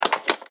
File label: capsule close